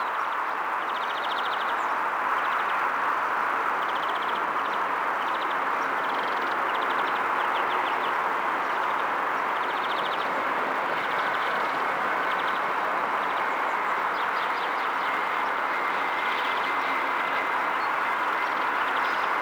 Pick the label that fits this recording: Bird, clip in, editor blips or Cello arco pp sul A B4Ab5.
Bird